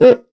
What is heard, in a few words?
MIXED PERC; 90 s Sample CDs